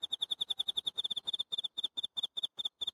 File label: Amb Hong Kong Insect Cicada